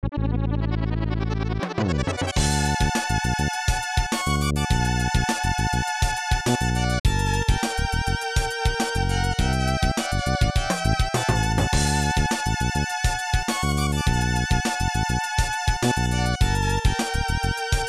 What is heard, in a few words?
Congratulations!; Yuuyu no Quiz de Go Go; snes